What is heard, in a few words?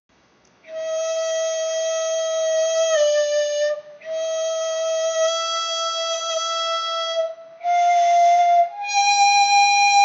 maya temple flute